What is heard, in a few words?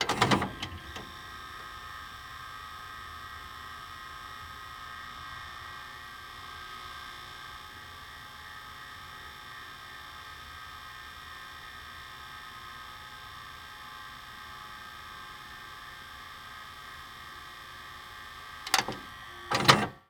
Technology Electronic VCR Press Play And Stop